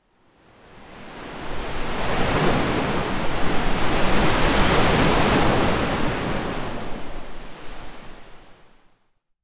Ocean waves

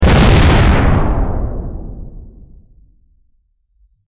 bomb explosion

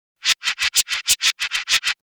spin

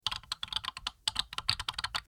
Typing on keyboard

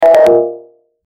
error alert; Sound FX

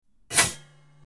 Toaster

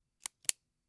Pen click

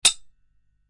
Metal clank

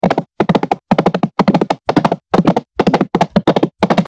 Horse galloping

